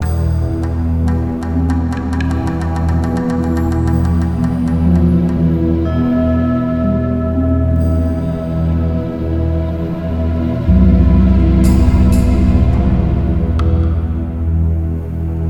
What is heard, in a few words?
Caves Melody Loop; Ninjago Monastery; lego universe macos windows gamerip 2010